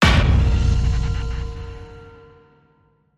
hit

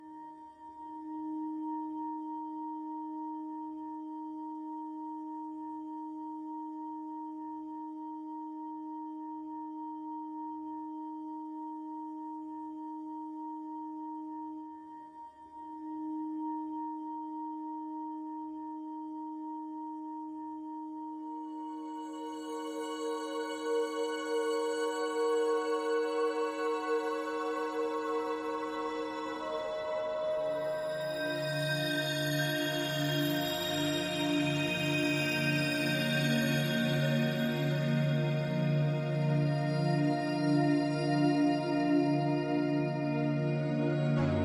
Menace Drone Light

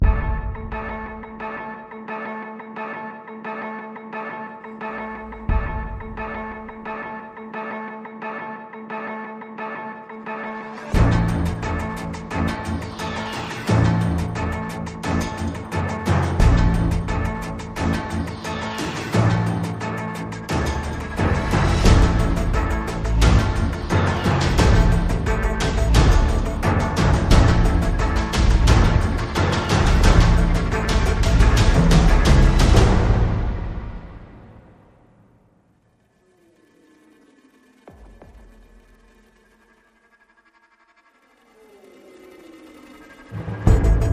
Perc and Pulses Only

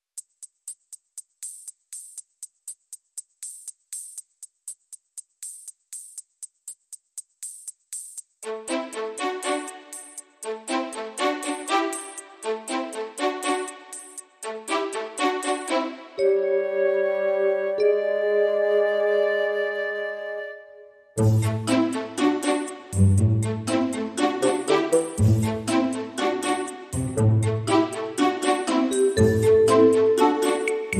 Modern Percussion No Drums

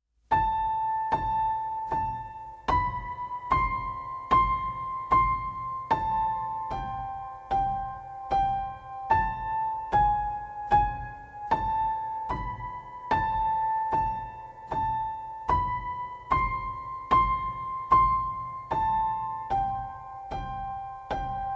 High Pianos

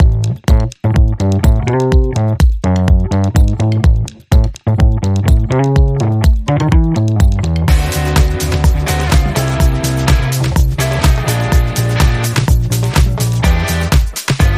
Bassline, Underscore

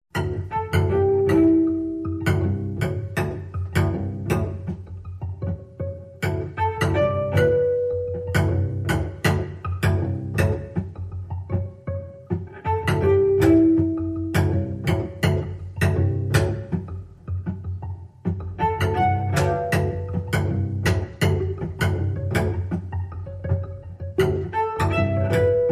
Cello Suite 2 Variation 3

